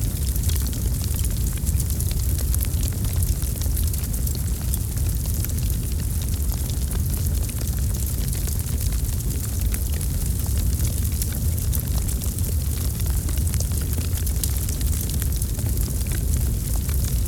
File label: Bonfire